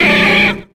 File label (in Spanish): Grito de Machoke